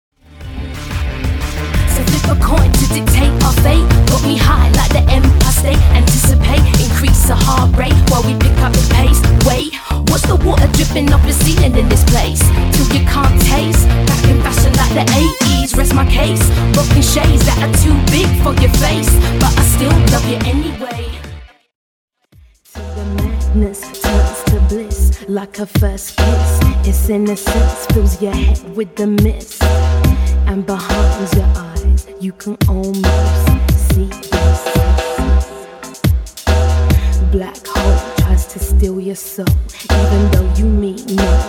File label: UK Rap; UK Rap Showreel